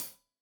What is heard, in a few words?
Hat Tight, Cymbals Stereo Hi-Hat; Hat Tight 1; Deeply Sampled Drums